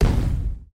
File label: bomb